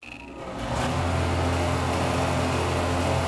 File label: mine Fan; machines mechanical